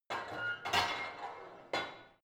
barbell heavy plate put on creaky, fitnessstudio

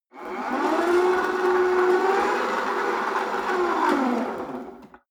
rope trainer pull sequence medium, fitnessstudio

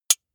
soda can tap fingernail single; sport freizeit

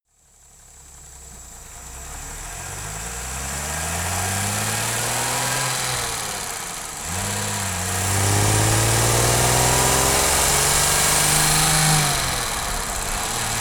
car jaguar e-type accelerate decelerate engine

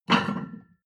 plate on plate take hard

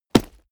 combat cloth punch soft; krieg-waffen; nahkampf; soundpool

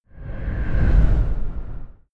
sci-fi vehicle train passing fast short; raumschiff

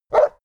border collie bark; natur-tiere; hunde

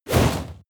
comic swoosh; swooshes-swishes

